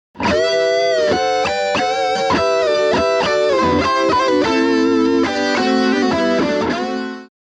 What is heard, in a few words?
tone demo